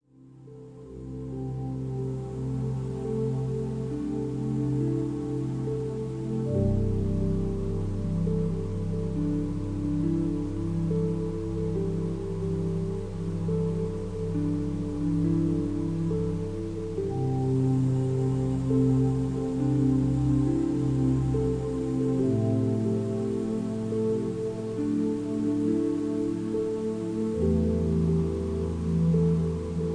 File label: Deep Sleep Binaural And Isochronic Pulses